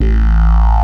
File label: hardcore, BASS, Techno Trance; Techno Trance Essentials